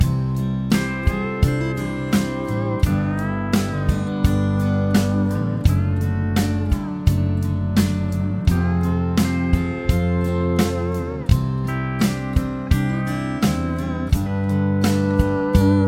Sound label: chord prog with mixture